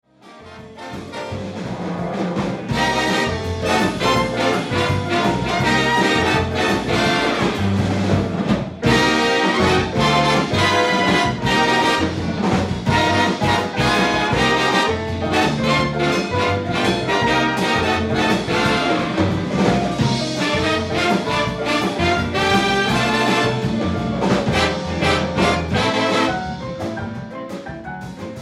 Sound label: Montuno; Pablos